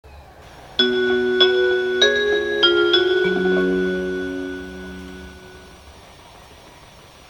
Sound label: chime